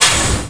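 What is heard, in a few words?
small pneumatic, doors; SINDEMO; environment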